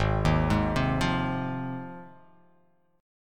G sharp 6th G sharp down, chord

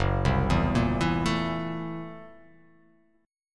F sharp 7th Sharp 9th Flat 5th F sharp down; arpeggiated; JGuitar

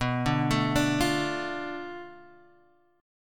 B-Minor 7th Flat 5th B down; Bm7b5; arpeggiated; JGuitar

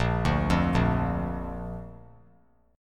Bb Major Bb down, chord; arpeggiated; JGuitar

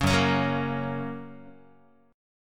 Gb Major, Guitar; Gb strummed; JGuitar

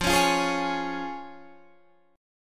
F Minor Sharp 5th, Guitar Standard, chord; 13,16,15,13,14,16; Fm#5 strummed; JGuitar

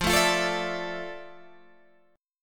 F Major 13th Guitar Standard; FM13 strummed

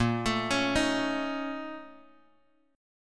Bb Minor Major 11th Bb, Bass Standard; BbmM11; arpeggiated; 18,24,23,20; JGuitar

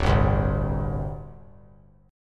D 7th Suspended 4th, down; strummed; JGuitar

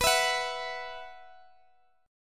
B Suspended 4th, Guitar, Open D

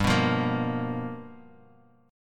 G 7th Sharp 9th G chord, Guitar Half Step Down

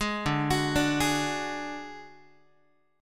D Major Flat 5th D Guitar, chord; arpeggiated; JGuitar

